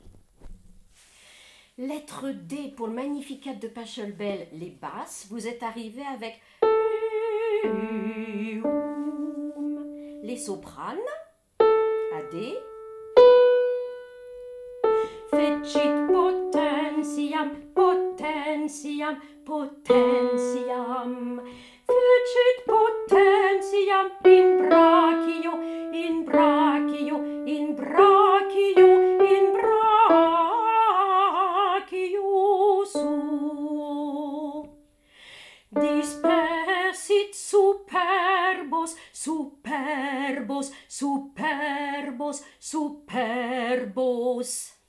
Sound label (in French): Magnificat Basse; Pachelbel